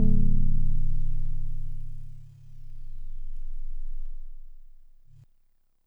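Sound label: DX100 C sharp 2; David Lynch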